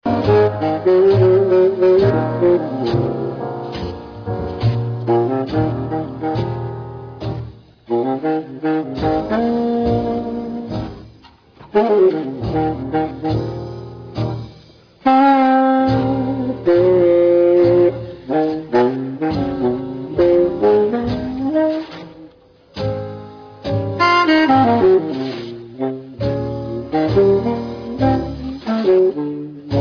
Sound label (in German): blues, saxophon